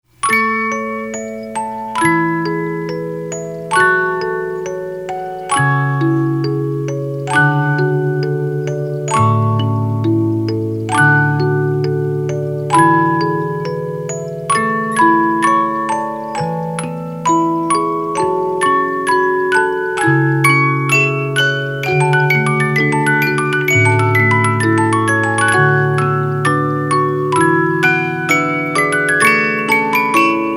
Canon in D, 50-Note Movements

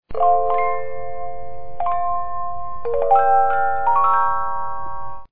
Clair De Lune, music box 50-Note

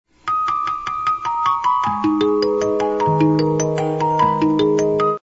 Fur Elise 2 Parts, 50 Note Movements

